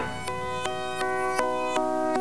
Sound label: wrong